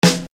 Snare Dr

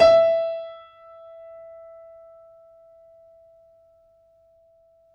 FF E 6 off, Grand Piano off mic; AKAI S6000 CD-ROM - Volume 2

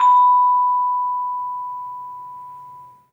vibes; 3D Sound; 3D Keys VOL-1

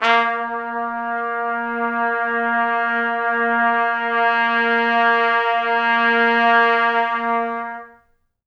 A#2, Pop Brass; Best Service; ProSamples vol.19; AKAI

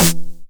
909 SD Tape Sat

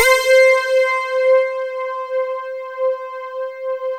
JUPITER C4; Sound Gallery - Charged; PROPHET 10

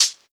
SHAKE; Houseworx; Drums; Perc

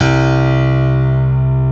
PIANO C1; AKAI S-Series CD-ROM Sound Library VOL-8

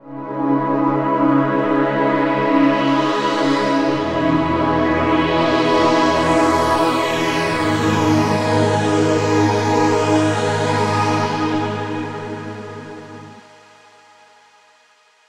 NRG Pad

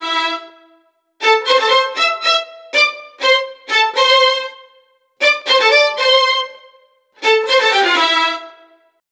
STRING LICKS, Disco

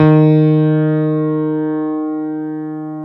CP-80, Yamaha CP-80; Greytsounds VOL-1

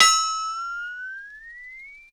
PERC; Houseworx; Drums

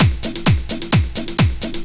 130 tribal, LOOP PERC TRIBAL

